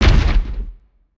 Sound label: Spider Boss Jump Impact; creatures mechanical